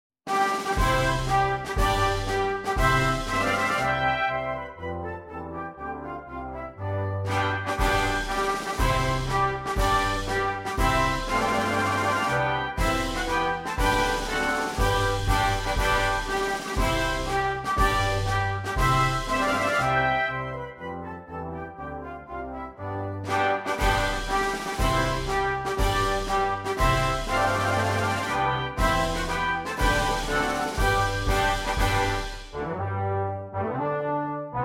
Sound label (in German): Marsch